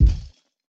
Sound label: Footstep; Baron